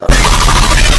nibble ear

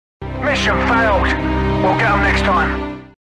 mission fail

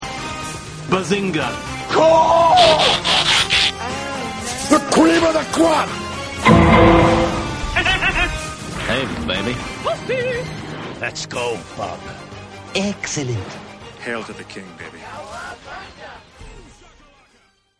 tv intro

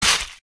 snowball splat

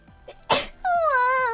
sneeze; Blessed Sneeze

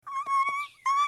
squeak

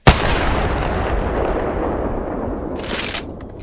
kraber sound

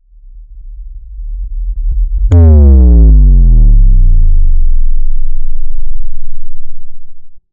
cinematic bass drop

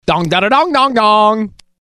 dong duh duh dong

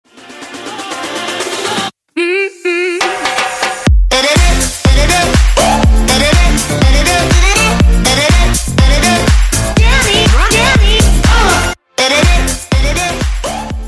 twitch sub

